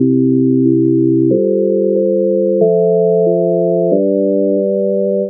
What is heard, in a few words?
orchestra